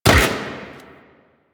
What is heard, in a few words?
Rifle Fire; Warframe; Venus; Venus Elite Spaceman Leader; Supra